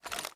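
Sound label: assault rifle reload empty fast extended magazine start; weapons; gmod; viper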